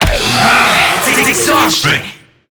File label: Infested Lich Pain A; Zeke